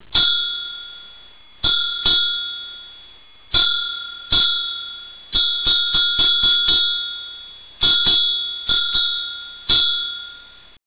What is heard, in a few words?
temple bell